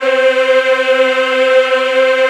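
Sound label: C4 men women eh, Choir; USB Soundscan vol.28; AKAI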